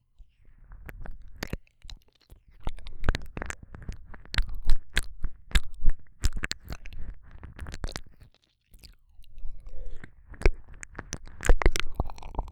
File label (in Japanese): 舌でたっぷり弄くる耳舐め, ASMR; 【超耳舐め特化】夜に癒されるたっぷり耳舐め【安眠ASMR】